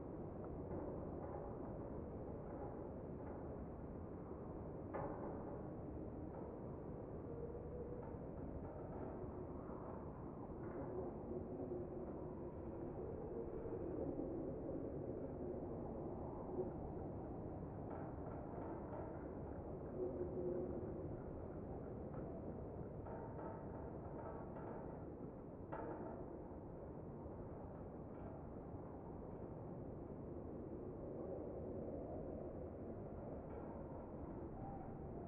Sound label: amb int small room; Titanfall 2